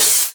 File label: Open HH, Cymbals